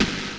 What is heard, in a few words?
Snare, Genesis; TMNT Tournament; M8